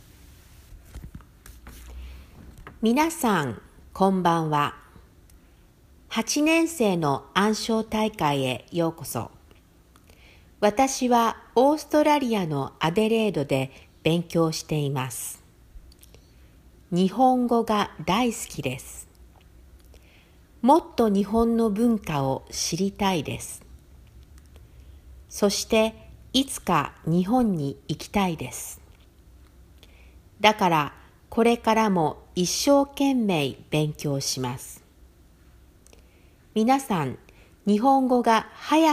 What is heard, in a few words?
Recital Slow; Year 8